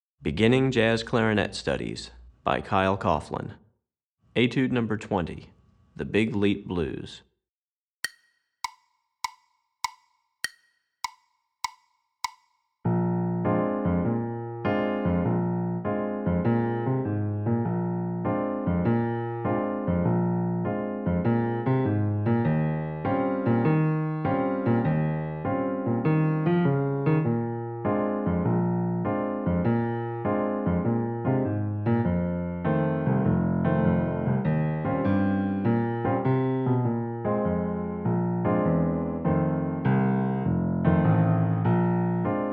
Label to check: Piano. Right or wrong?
Right